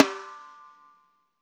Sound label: LOFI, LO-FI KITS; Spectrasonics; Burning Grooves CD2